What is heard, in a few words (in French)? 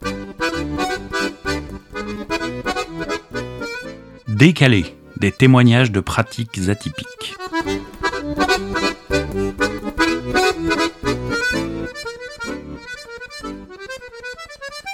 jingle decale; podcast